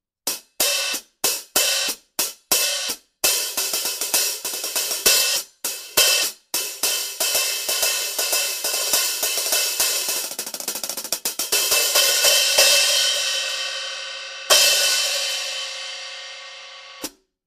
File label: Mist 13 Inch Brilliant Hats